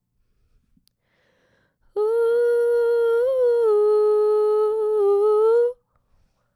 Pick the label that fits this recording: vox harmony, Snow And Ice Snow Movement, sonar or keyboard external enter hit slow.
vox harmony